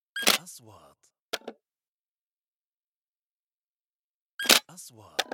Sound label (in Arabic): نغمة تنبيه فلاش, transitions